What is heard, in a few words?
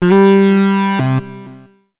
Severe Weather Advisory